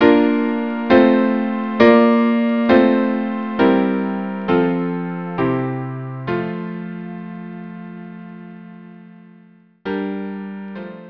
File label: choral, string quartet; ein choral